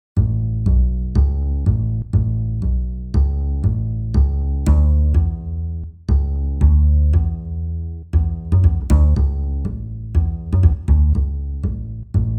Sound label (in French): frere jacques contrebasse